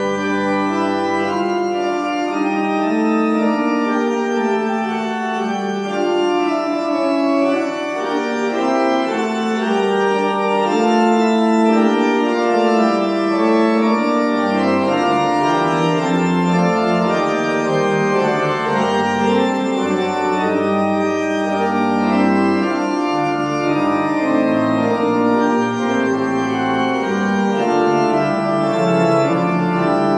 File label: organ recital in St Nicholas church Great Yarmouth